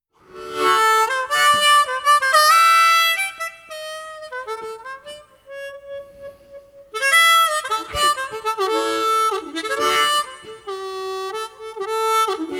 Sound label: Harmonica musings; The Blues Brothers 2000 OST